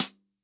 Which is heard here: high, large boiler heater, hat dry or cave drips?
hat dry